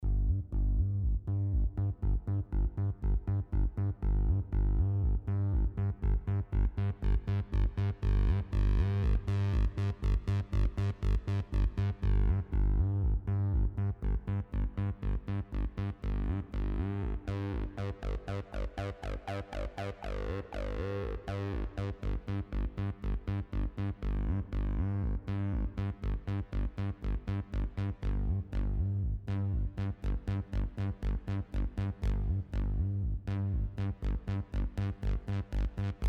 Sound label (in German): Schichtung